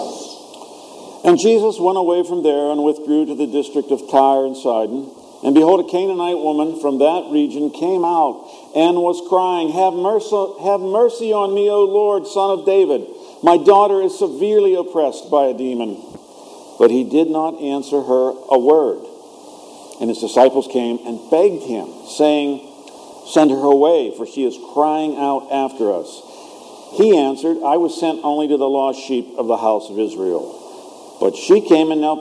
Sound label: Gospel